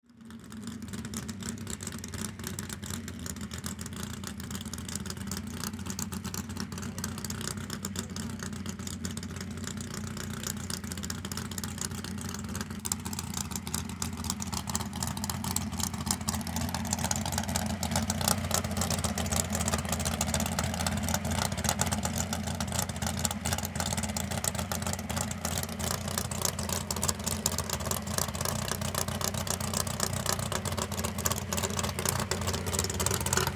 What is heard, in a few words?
Swamp Buggy Idle; Rumble; Transportation Watercraft; Boat; Boat Sound Effects; Boats (Sound Effects:Vehicles); Blastwave